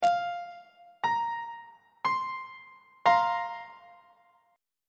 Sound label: b flat suspended 2nd 2nd inversion on treble clef